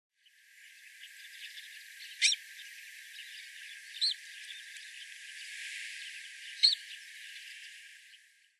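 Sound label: Saunders's Gull; hyogo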